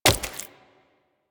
Wall Jump Flesh; Footsteps; Warframe; Tenno; Wall Run

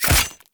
Drone Blade Hit; Weapons

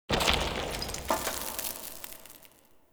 Dungeon Island Cave Crumble, Ambience; Warframe; Duviri

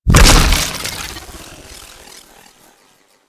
Shock Swarm Projectile Burst; Warframe; Infested; Shock Swarm Rifle

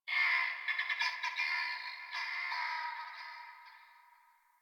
Birds Medium, Ambience, Plains; One Offs; Warframe; Eidolon Plains; Eidolon Landscape

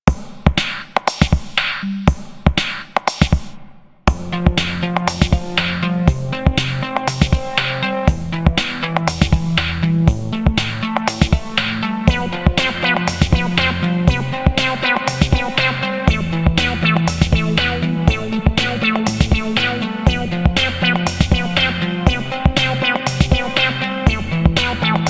Venus Market Music, Music; Fortuna

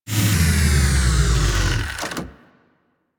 Mods Motor Large Stop; Warframe; Liset; Hub; Machines; Tenno Mods

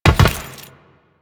Land Metal, Footsteps; Enemies; Warframe; Teshin; DE

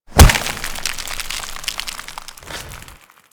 Frost Idle Noble Power; Warframe; Warframes

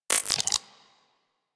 Shotgun Mech; Weapons; Revenant; Warframe; Tenno

